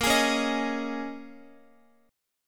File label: A sharp Minor 11th, Guitar; strummed; x,x,10,13,13,11; 4F,4A,5C,5G,5C,6E; JGuitar